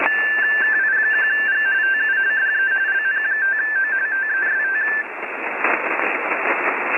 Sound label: Rtty